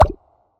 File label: reels stop; Magic Stars 5; Wazdan